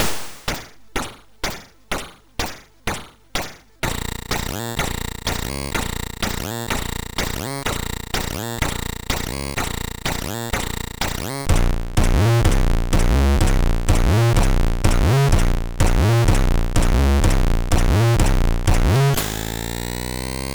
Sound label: sid, MOS6581; Luftrauserz; MOS6581R3